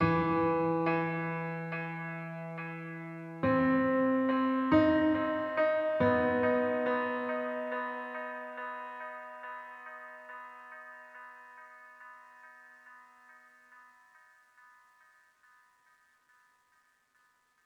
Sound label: One shot Piano